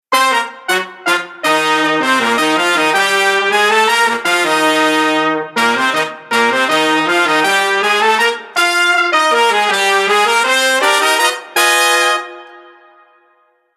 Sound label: Horn